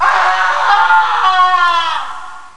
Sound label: scream, vocals